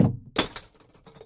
amethyst break; Minetest; VoxeLibre

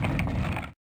chests shulker open; mcl chests

